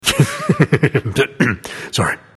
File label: laugh